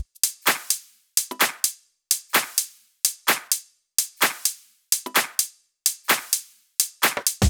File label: Loops 128BPM, Drumloops